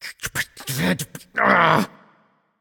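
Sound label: nonverbal grumble; voicepack; smite; merlin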